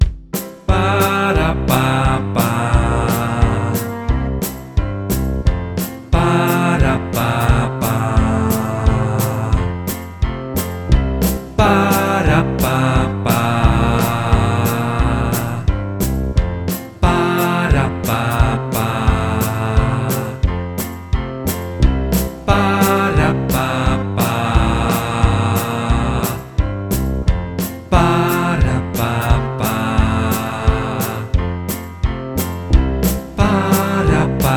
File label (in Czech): Zpev S Klavirem